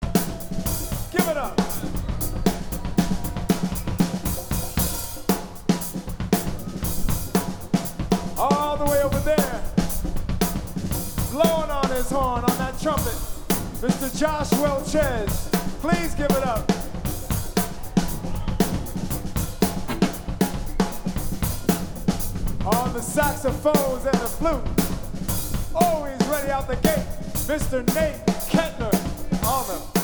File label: Break Drums